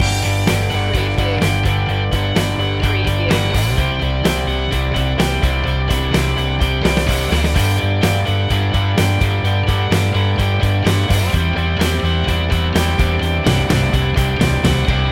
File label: driving force loop